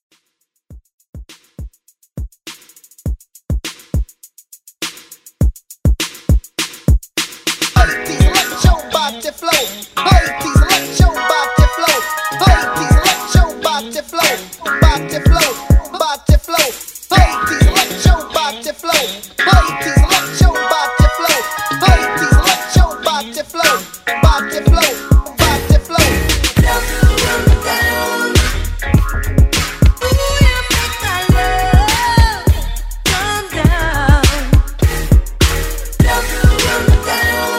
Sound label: Hype